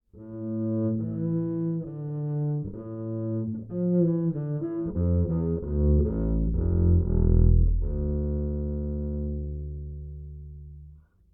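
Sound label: acoustic bass Bowed direct input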